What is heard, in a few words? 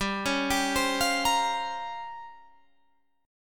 Bb Minor 6th Add 9th, guitar